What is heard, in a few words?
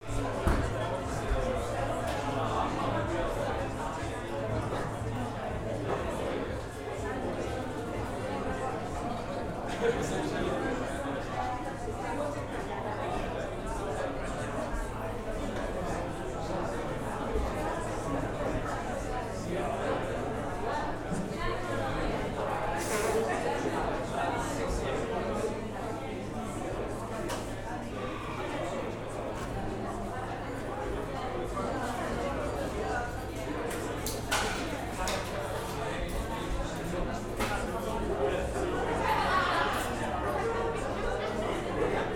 Busy Cafe